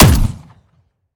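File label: weapon player; romeo870; gmod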